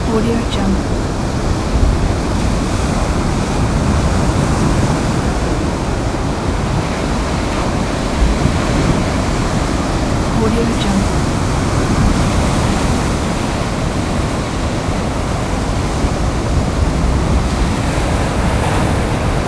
sea waves beach

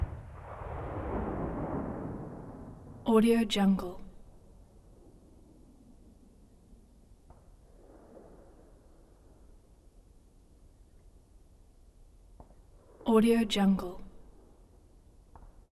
distant gunshot

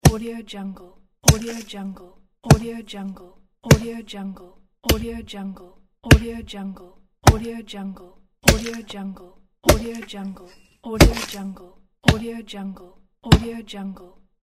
axe wood chopping

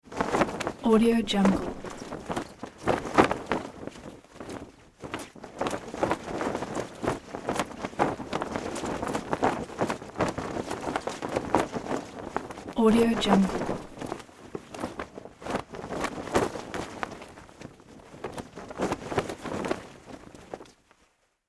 large flag flapping in the wind

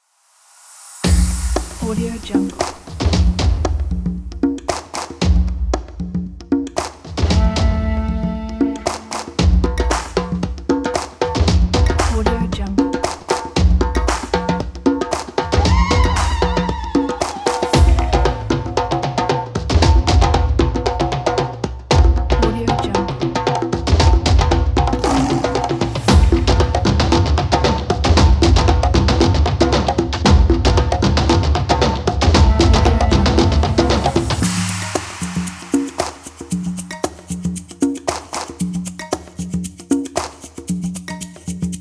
african safari

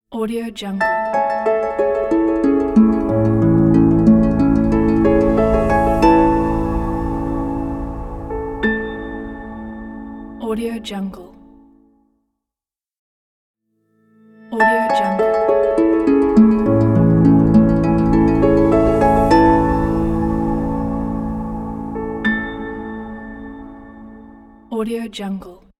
ambient logo

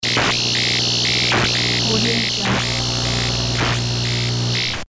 static glitch

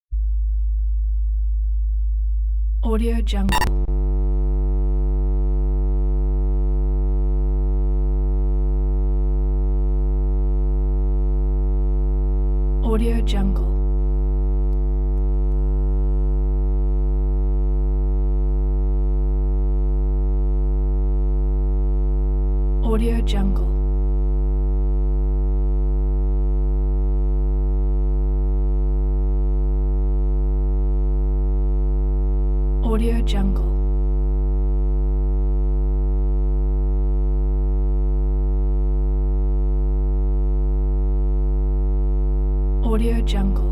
electric hum

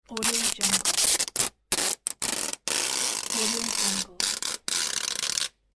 scotch tape

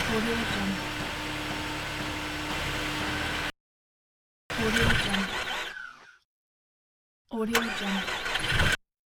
scifi dieselpunk big clunky machinery; stormwaveaudio

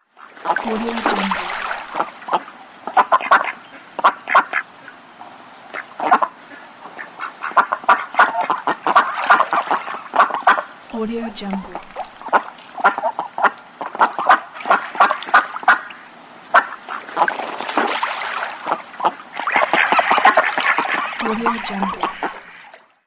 ducks

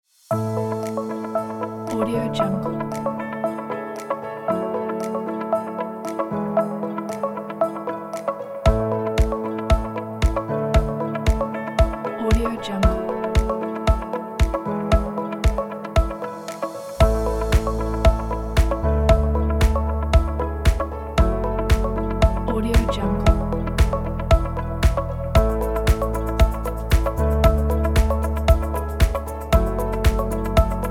corporate motivational technology; audiotekmusic

